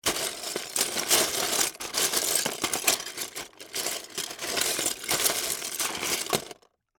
Legos Search, Through, Box, Movement, Long, Plastic, Toys; Foley; Rustling Sound Effects; BlastWave